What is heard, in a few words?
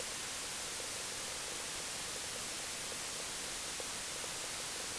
brainsignal